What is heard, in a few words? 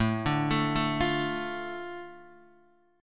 A Suspended 4th Sharp 5th down Guitar Open D